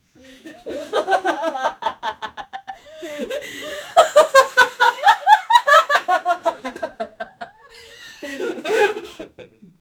laugh track for a comedy, audience

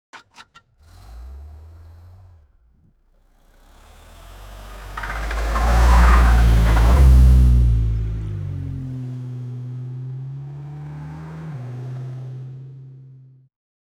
car fast stoping and cras

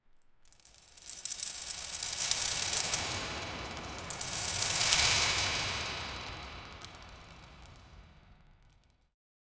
ghostly chains rattling in a dungeon; paranormal

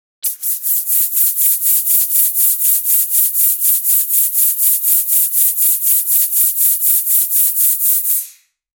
game sfx a fast clean; sound effect

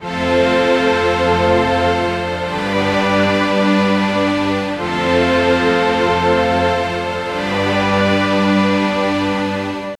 more divine

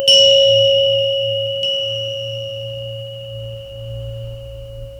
generate a single soft in, temple

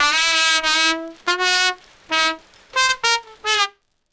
soft rain sad trumpet wah

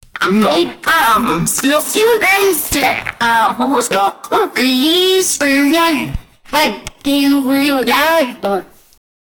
wash the dishes sound

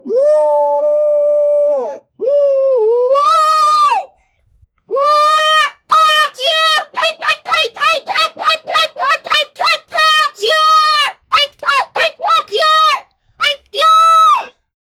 waaa peta kitaaaa teriak, monkey

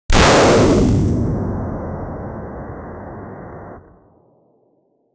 robot

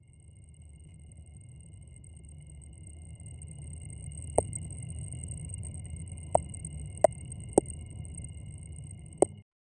ethereal crystal; ethereal crystal contract

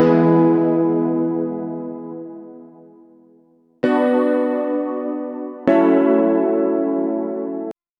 e minor chords loop

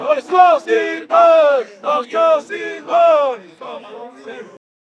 a group of guys chanting

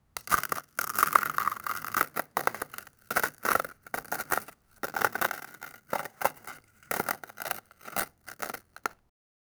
asmr video of slow cuttin; cutting

